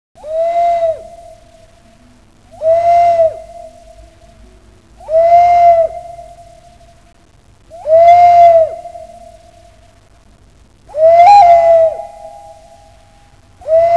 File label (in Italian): musica strumentale